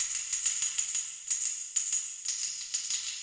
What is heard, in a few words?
tamb